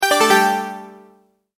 Multimedia Tone-Return/Back

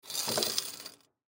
Observation Binoculars Tilt Down Coins Shake; Amusement Park; Foley; Blastwave

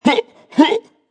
Vocal Deep Huh Huh

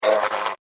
Hornet, Land, Fly, Wings, Buzz, Insect, Bug; Blastwave; Animals:Insects

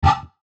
Mouseover Low Filter Swell; Multimedia; Blastwave

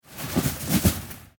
Weather Snow Impact Dump; Sound Effects:Weather; SFX Bible 6

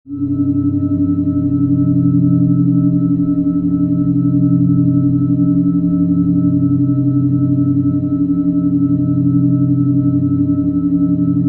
Scifi Ambience: Generic Spaceship Style; Sci-Fi (Sound Effects:Science Fiction); Stuart Duffield

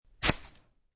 Single Scssr Cut, Single scissor cut.; Scissors; Offices

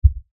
LFE Hit, Lfe Hit Low Bass Impact; Blastwave; Sound Effects:Sound Design

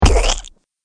Mud Splat; Slimy Sound Effects; sound design sound effects

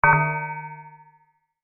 impact metal med; Metal Impact ( Medium ); Materials; Metal Impact (Med) 1; McKinney Sound

